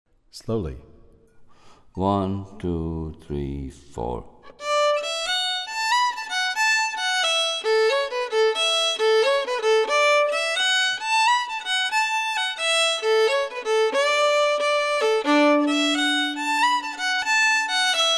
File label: Slide slow